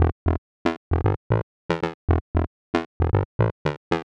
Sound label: funky disco slap bass loop 115bpm